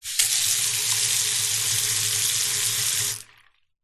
household bathroom tap faucet turn on run off short empty no plug